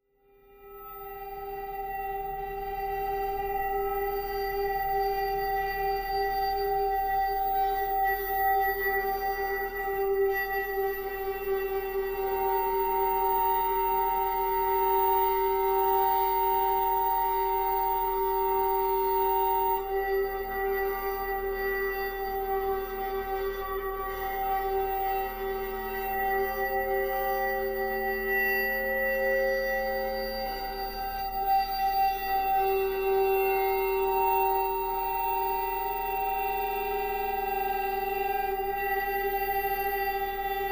Oven Rack Bow, bowed metal

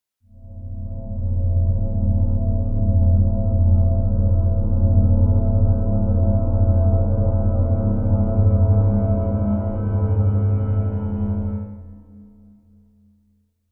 Magnetic Pulse Wash

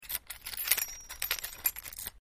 Unlocking A Steering Wheel Lock And Movement; The Club

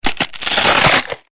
Wood Splits Rips Wood, trees

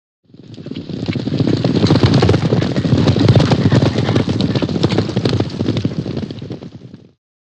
By 6 Horses Gallop Up P, group

